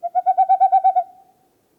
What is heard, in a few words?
Owl